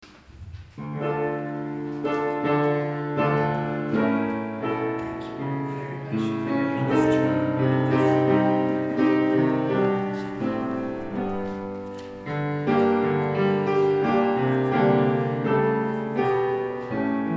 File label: Offertory Hymn